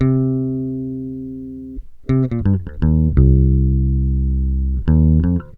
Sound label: TOUC 86 CM7, Bass Grooves; USB Soundscan vol.30; AKAI